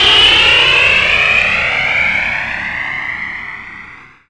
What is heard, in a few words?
JETTISON